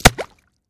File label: Bullet Impacts Water